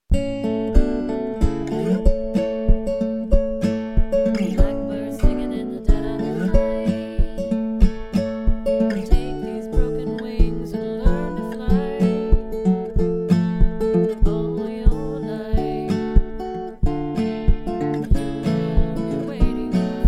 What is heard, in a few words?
Soprano